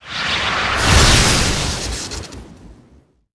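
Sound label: Ghost Attack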